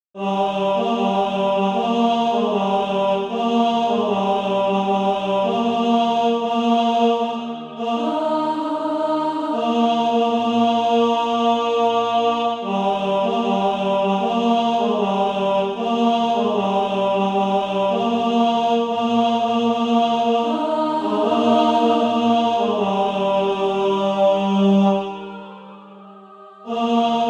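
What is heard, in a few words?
tenor, SATB Practise Music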